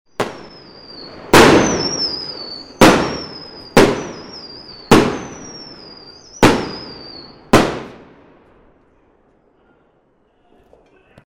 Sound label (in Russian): svist i hlopki; Звук салюта; Звук фейерверка; звук салюта победы